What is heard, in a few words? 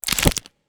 Toggle